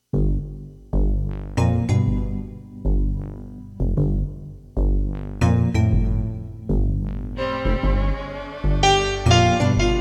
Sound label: Sly Jazz